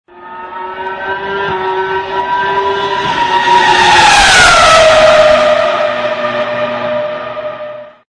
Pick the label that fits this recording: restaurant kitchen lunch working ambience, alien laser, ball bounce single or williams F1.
williams F1